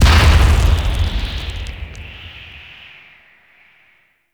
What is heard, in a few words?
Cave Door Open; Ambience; Gameplay; Warframe; The War Within